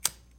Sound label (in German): menupunkt